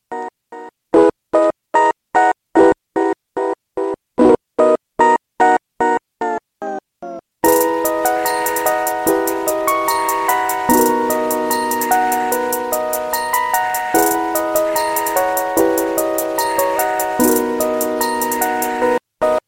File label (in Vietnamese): Beat Khong Loi